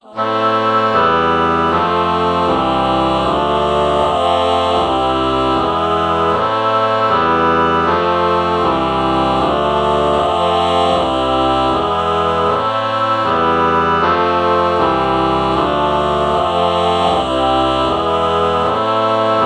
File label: ah oboe chord morph